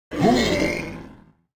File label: ogre attack; mob